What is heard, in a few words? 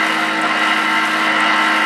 Plasma Cutter Loop